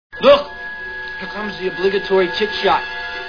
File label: scream